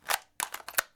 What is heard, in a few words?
Gbc Reload